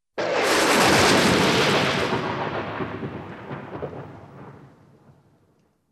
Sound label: Lightning strike with thunder crash; Weather; The BBC Sound Effects Library